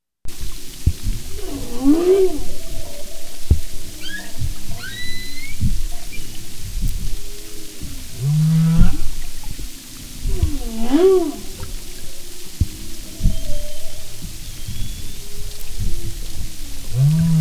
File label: humpback, Marine Mammals